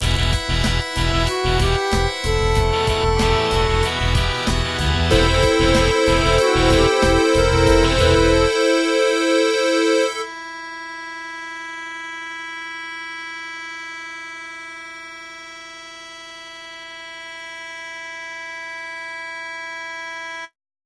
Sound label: Test Flute